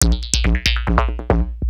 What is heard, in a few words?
Beats, Industrial